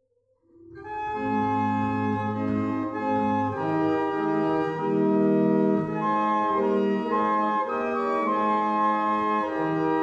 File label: barrel organs